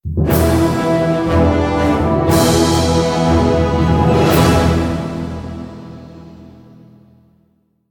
win

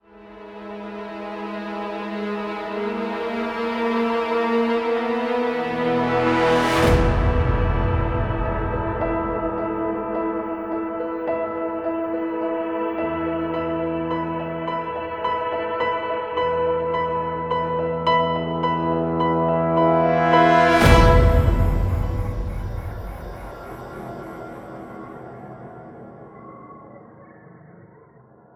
Intro Signal

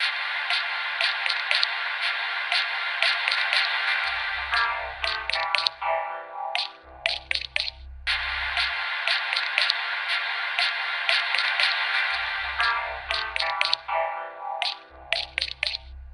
Tv Music

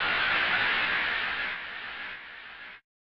Crowd; captain tsubasa v hasha no shougou; snes

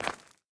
dirt concrete footstep

